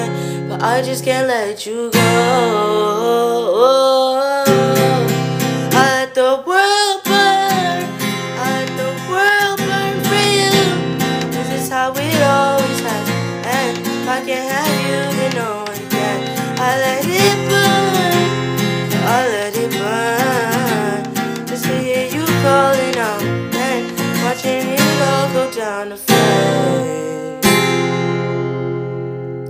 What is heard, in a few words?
Guitar Cover